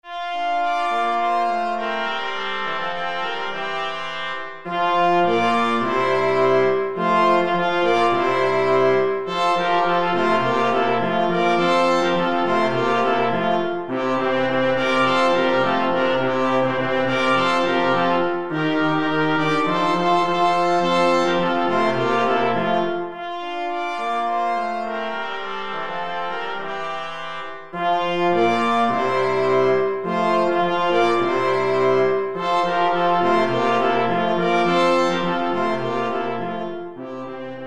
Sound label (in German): blechblasquintett